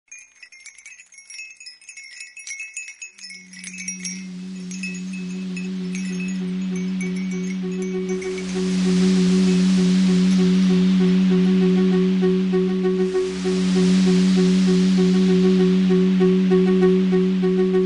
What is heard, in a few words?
Ringtone